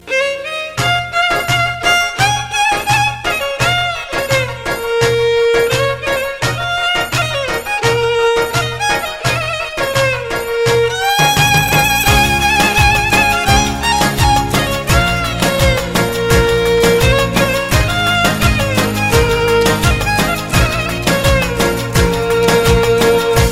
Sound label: Albanian Music Beat